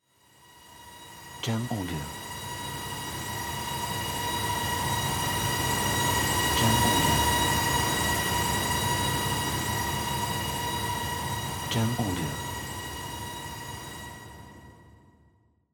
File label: Riser Transition